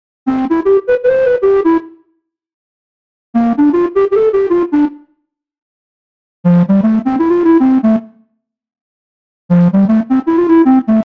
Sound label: Lead; PatchFactory